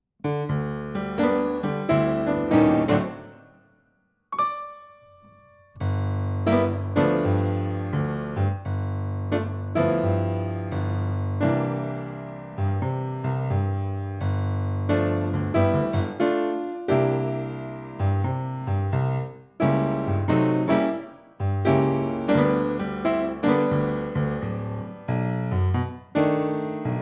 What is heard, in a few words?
Cello; Cello Acc